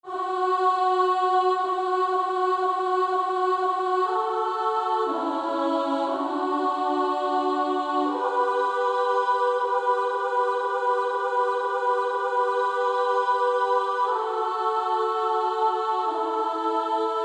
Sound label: Female Choir